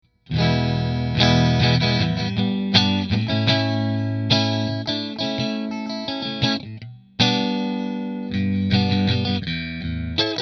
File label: Rahan Double Maple Position 1 Fender, guitars; Rahan Guitars RP Double Cut Figured Maple